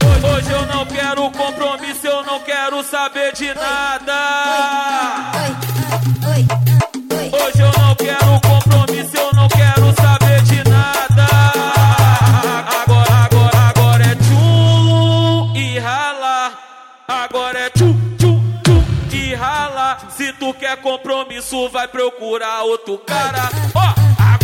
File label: funk bass